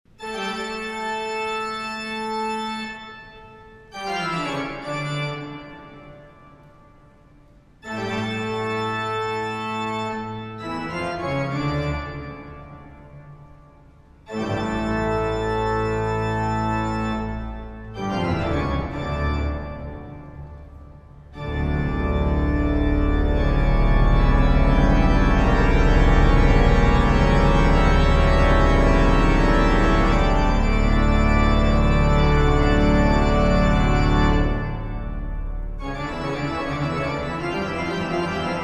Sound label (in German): Toccata und Fuge d-Moll; BWV 565